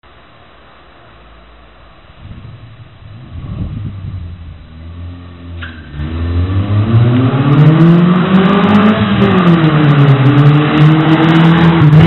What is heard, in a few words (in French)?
Silencieux tuning en inox sur une 1.6; FXR-Corp